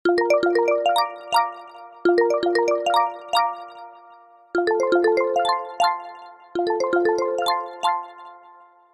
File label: brisk